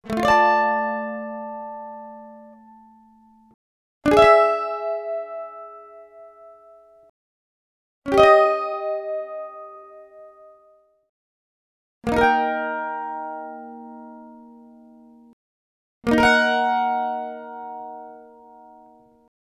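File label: sounds mandolin, mandolin chord 5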